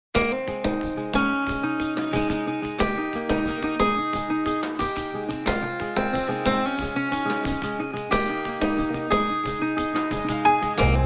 cute ringtone

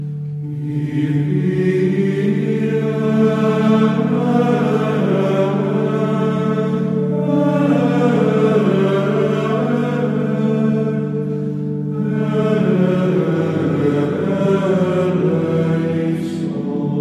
kyrie eleison chorus

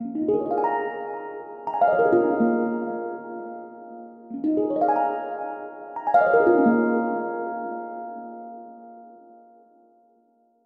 pentatonic; ubuntu touch; halo

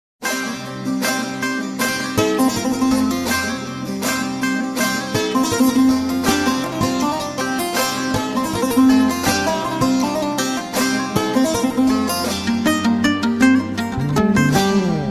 kurdish music

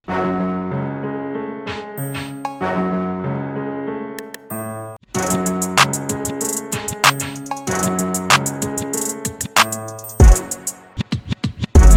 instrumental

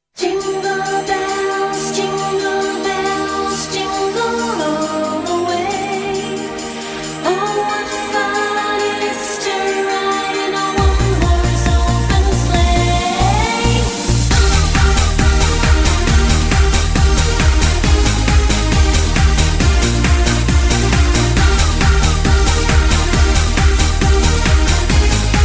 jingle bells dance mix

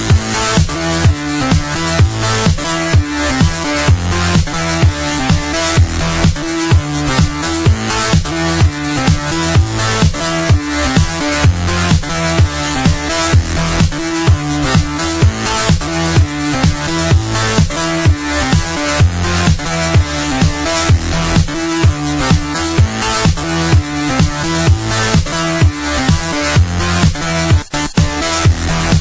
menu theme music; ringtone; pro evolution soccer 2011

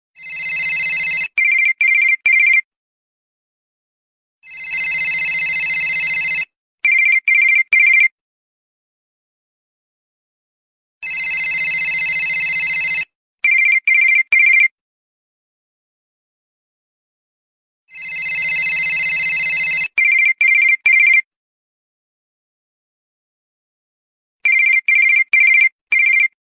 office ring, ringtone

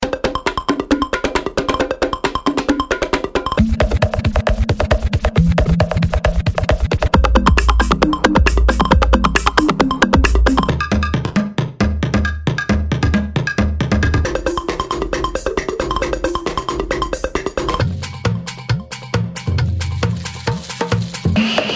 tribal drums mix

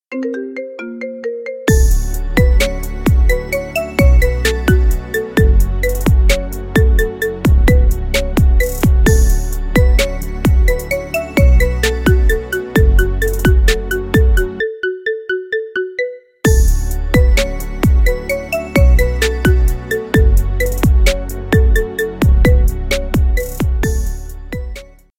marimba remix

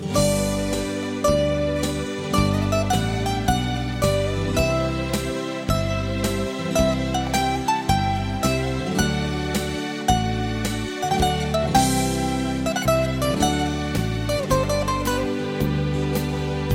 greek instr song

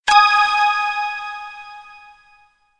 echo ding

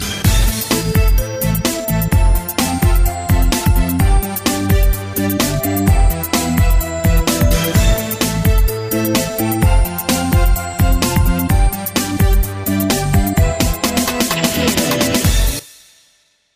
break dance

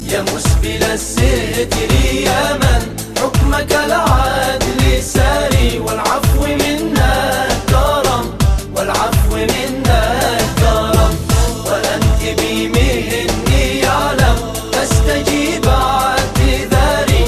arabic nasheed

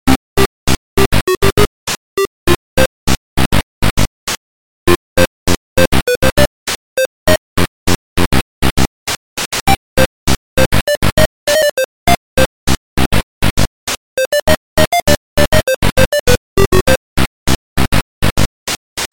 8 bit; sherlock theme

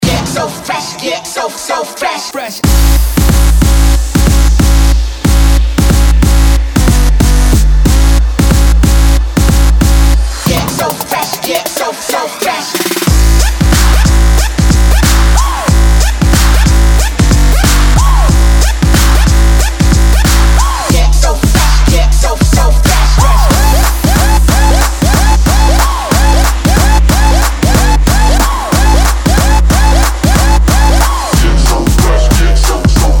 trap remix

